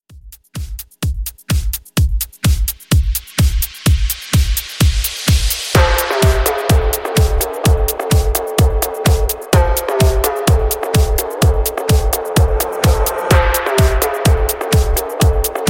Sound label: Bigroom 127Bpm